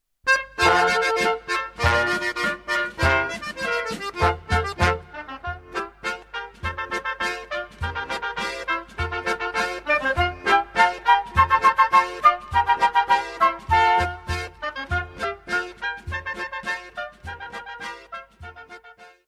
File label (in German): Vogelischottisch, Suisse